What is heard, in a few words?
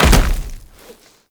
Land Heavy Dirt, Footsteps; Warframe; Tenno